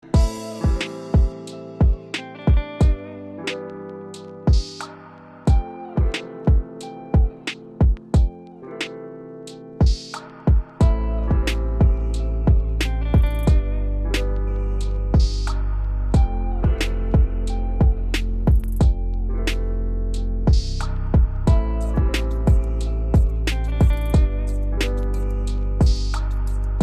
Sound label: GUITAR RAP BEAT INSTRUMENTAL RINGTONE